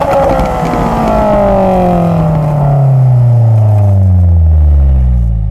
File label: EXHAUST DECEL